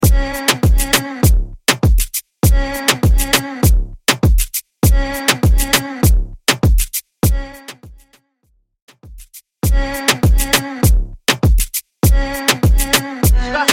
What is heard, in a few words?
Mainstream Moombahton